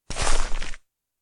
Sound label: Grass shift